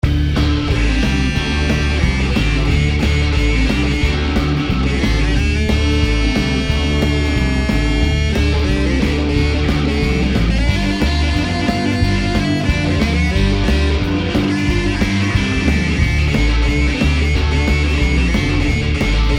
funky

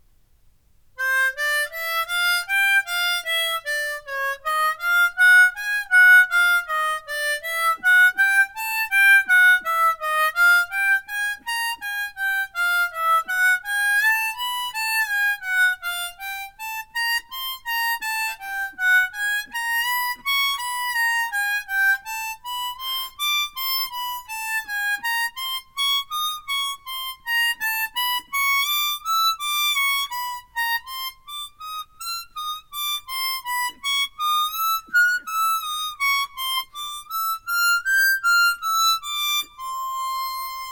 sub30 scale

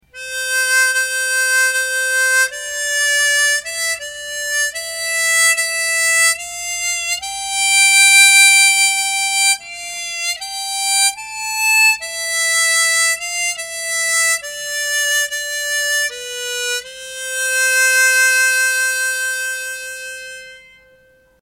violin; furusato

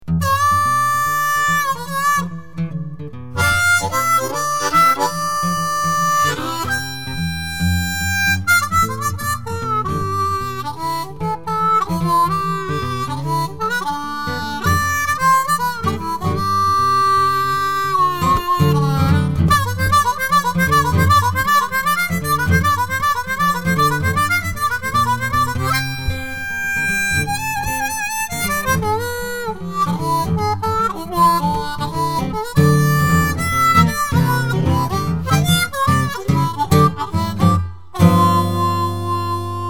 blues

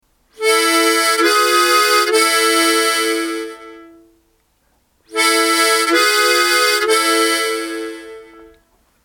harmonic minor; waon

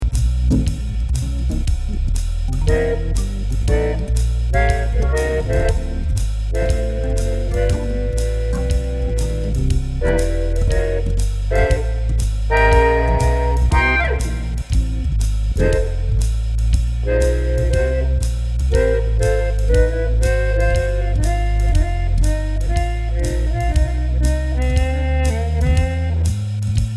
swing

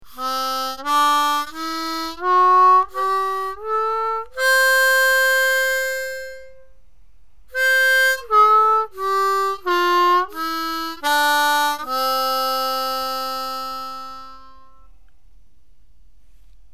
bad tone

